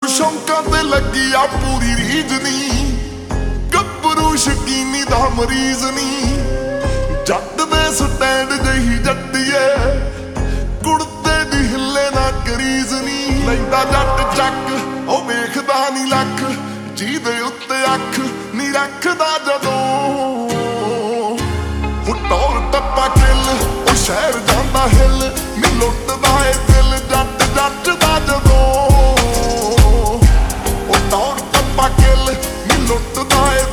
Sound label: Slowed Reverb